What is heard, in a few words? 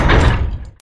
building bump